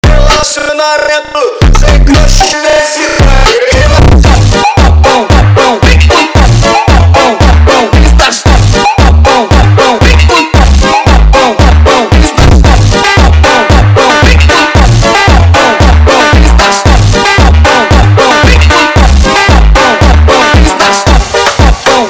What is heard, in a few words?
funk sped up